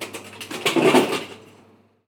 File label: Vending Machine Soda Can Drop; SFX Kit; Foley - Misc